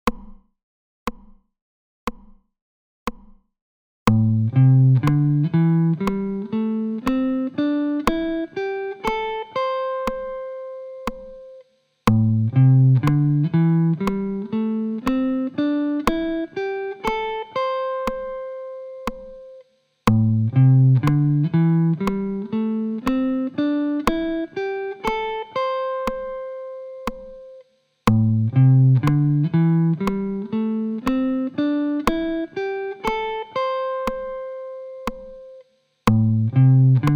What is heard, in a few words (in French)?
Vers l'aiguë; objectif solo 15 jours pour apprendre a improviser